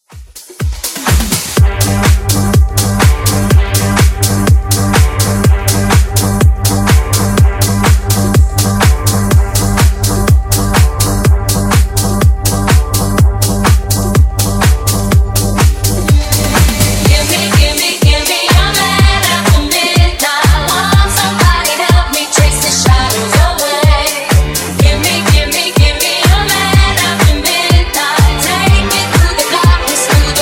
Funk 124Bpm

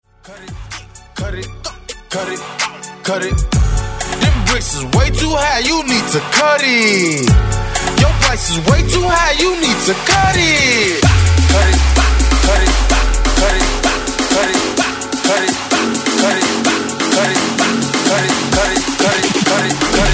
128 BREAKMIX; DJDOLLS

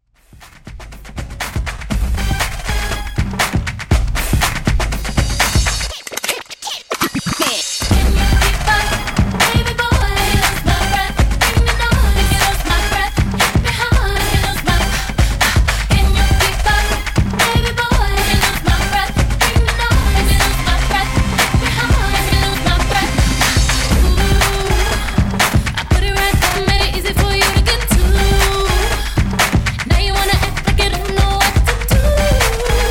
In Outro Party 120Bpm; djyan

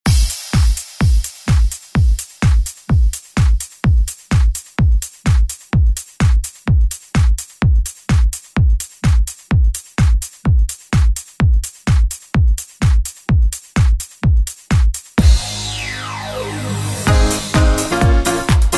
90s ReDrum; DJ Mhark

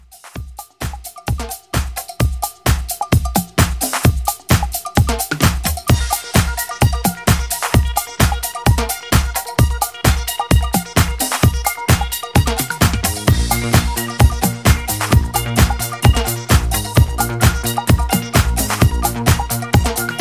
80s Dance Re Drum 130

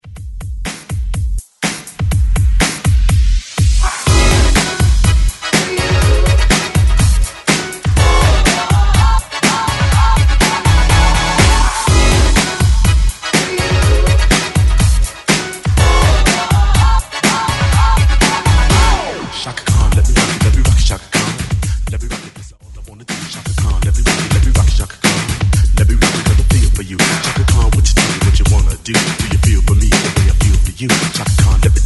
80s reworx

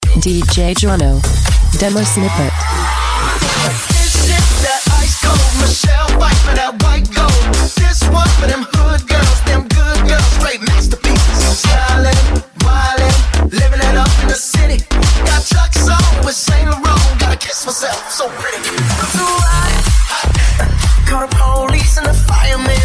Super Funk Mix

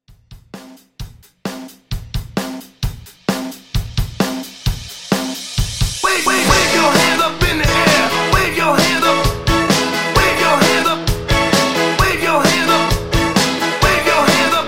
80s, 131 Bpm

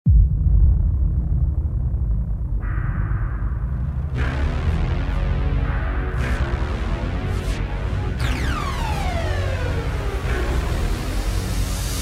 No Intro; DJ Mhark; ReDrum

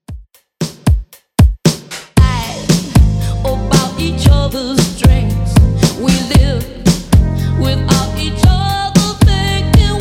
80s Redrum 115bpm

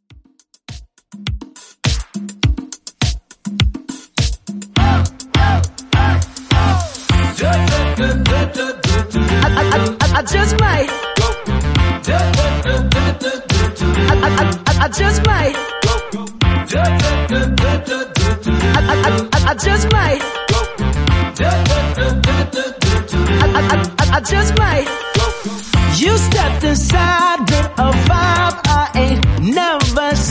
DISCO 103BPM; remixplanet

